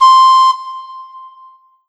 Korg TR Rack Recorder C6

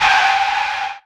Tire Screech

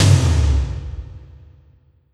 Korg TR Rack Lethal Drum Hit

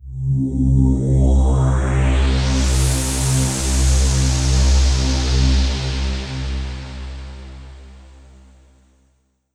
Korg TR Rack Motion Synth C2

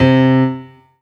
Yamaha TG500 AP Grand C3

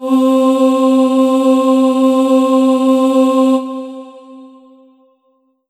Kurzweil K2000 Mixed Choir C4

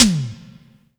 Yamaha TG100 Synth Tom

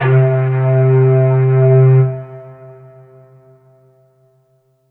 Korg M3R Reed Flute C3

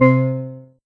Ensoniq ESQ-1 Steel Drum C3

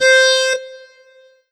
Korg TR Rack Akkordeon C5

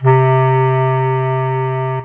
Yamaha TG-77 Clarinet C4

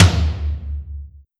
Yamaha RM50 Low Tom

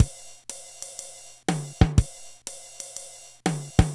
Yamaha PSS-280 Swing Band

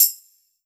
Korg N1R Tambourine

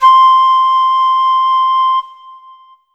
Yamaha EX5 Flute C6

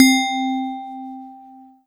Yamaha DX7 Metallfon C4

